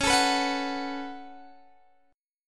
Db 7th Suspended 4th Sharp 5th Db down Guitar Open G